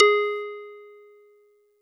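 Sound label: Marimba DX100 G#1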